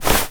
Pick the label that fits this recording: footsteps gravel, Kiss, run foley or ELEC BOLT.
run foley